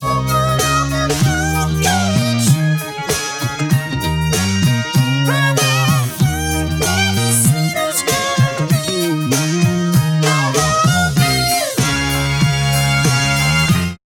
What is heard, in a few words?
Prosoniq TimeFactory DIRAC pitch shift 12